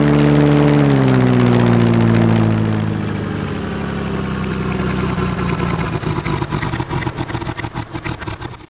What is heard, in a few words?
ROT STOP